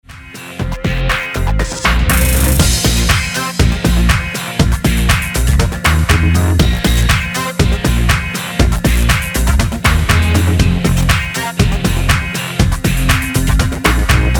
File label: Instrumental